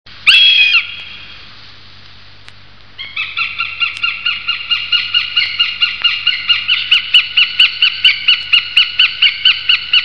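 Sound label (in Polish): jastrząb gołębiarz, głosy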